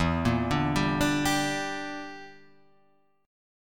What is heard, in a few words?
G Minor E, chord; Gm/E; arpeggiated; JGuitar